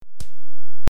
Face Punch

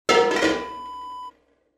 metal bucket set down

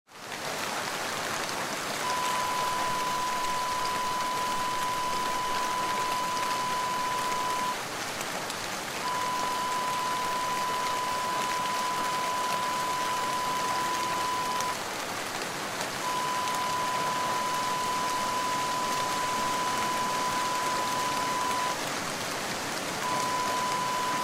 rain in forest

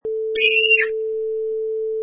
Little Girl Scream

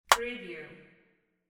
cell phone close

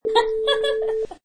Young Lady Laugh

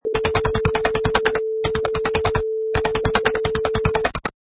Typing Fast on Computer Keyboard

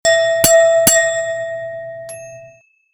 Wine Glass before a toast

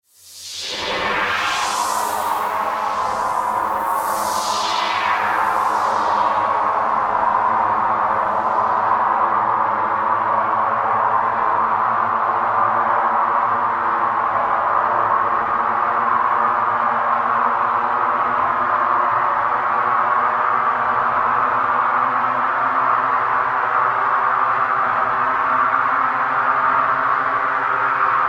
Sci-Fi SFX

